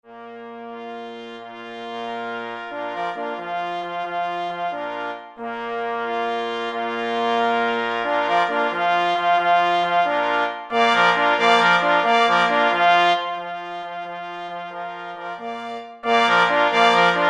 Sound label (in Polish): na 2 plesy i 2 parforsy; W droge mysliwi glos trabki plynie